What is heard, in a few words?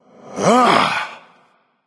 grunt attack